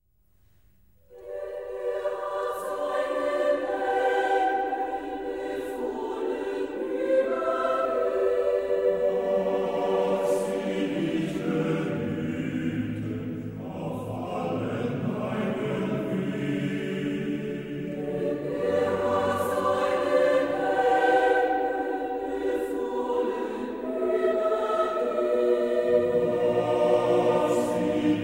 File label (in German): Chor